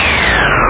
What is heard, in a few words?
engine down, bee hover; K TANK